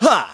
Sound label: Vox Attack; Riheet; kingsraid